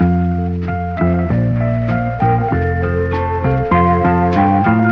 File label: keys